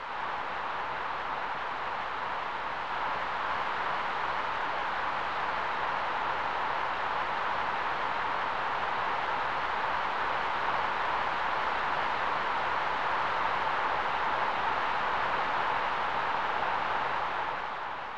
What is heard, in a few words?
Cheering Crowd; ncaa basketball 1992; snes